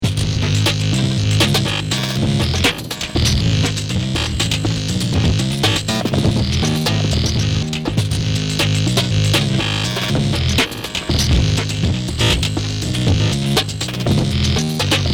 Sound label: Sci-fi